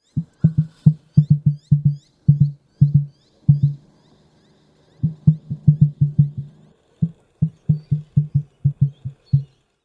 Emu Dromaius novaehollandiae

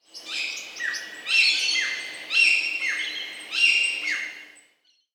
Pacific Baza Aviceda subcristata

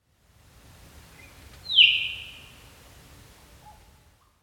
Rufous Whistler Pachycephala rufiventris

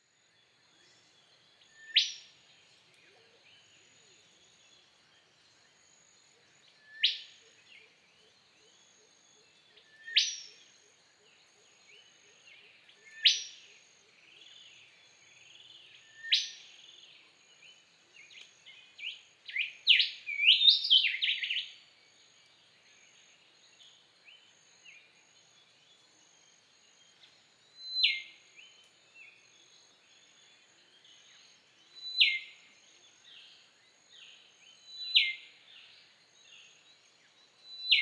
Rufous Whistler Pachycephala rufiventris

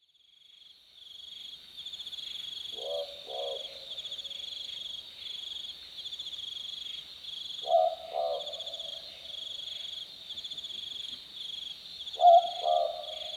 summer night boobook

